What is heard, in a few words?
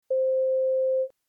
tonal